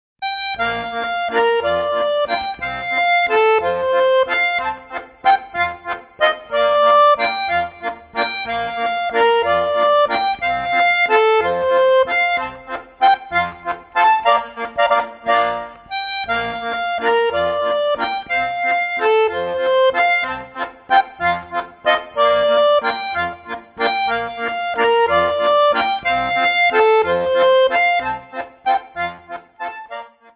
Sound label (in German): walzer